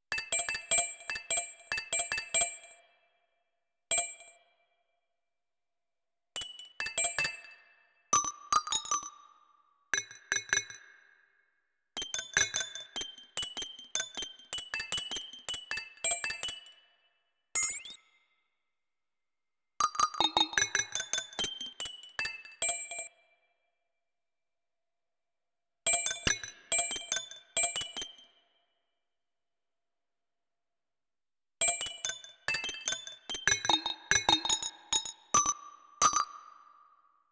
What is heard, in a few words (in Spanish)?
Percusiones sintetizadas